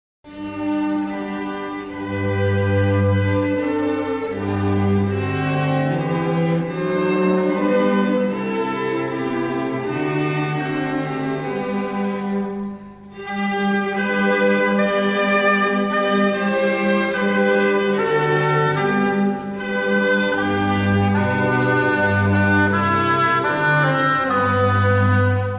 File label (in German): Bayernhymne (instrumental)